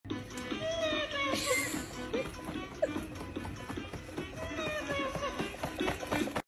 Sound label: dj bouncin beats